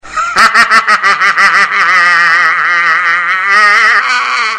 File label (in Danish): sjove grin, konge